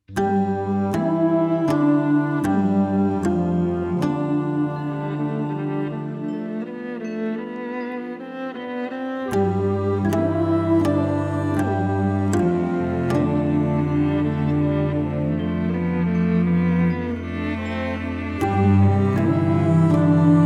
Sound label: viola clarinet voices cello qin; POEM; world premiere Wisconsin festival; sturgeon bay wi